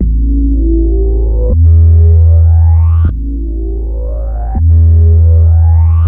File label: Bass, Synth Bass Loops